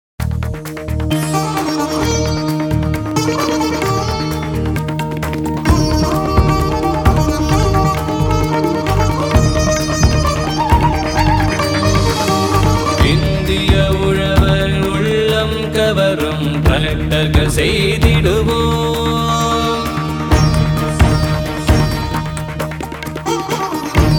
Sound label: ANTHEM TAMIL; TAFE